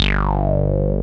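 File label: 303 acid bass; Pro-Rec - Synthsonic; TRINITY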